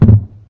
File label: bassy bump; goodnight jellybean; godot